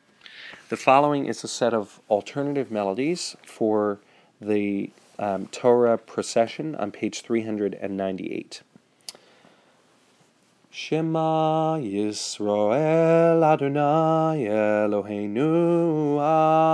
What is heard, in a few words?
torah service alt melody; shabbat tunes